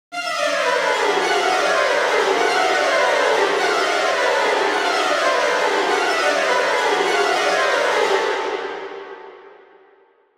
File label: Adrenaline Strings High, horror strings